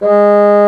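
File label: Bassoon mf G#2; Digital Sound Factory Virtuoso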